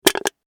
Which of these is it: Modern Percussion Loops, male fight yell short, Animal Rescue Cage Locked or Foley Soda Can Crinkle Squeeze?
Foley Soda Can Crinkle Squeeze